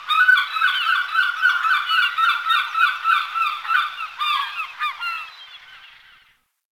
Beach Seagull; Warframe; Grineer Beach

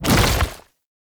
Tank Infested Arm Move; Warframe; Lasrian; LasrianTank; Movement

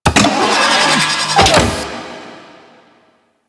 Reactor Vent Shield Open; Warframe; Missions

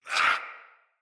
Pain Knight Male; Battle; Barks; Dialog; Mendicant

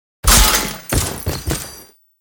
riposte 2H nikana finisher foley; Enemies; Warframe; Duviri; Dax

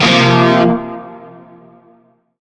Shawzin Pent Min Chord; Warframe; Narmer

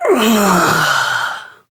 Death; Warframe; Corpus Lich; Persona C

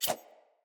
Dagger Whoosh; Weapons; Enemy; Daggers; Sinecure Dagger

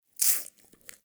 Wheeze Close

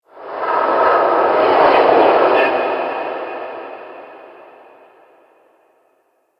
Cold Texture; Ambience; Crypt; Champion Crypt

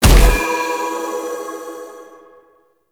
Choir Bow Arrow Explosion; Warframe

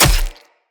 Short Sword Medium Impact Flesh

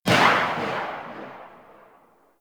Bladed Pistol Tail; Warframe; Weapons; Tenno

